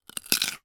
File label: human bite carrot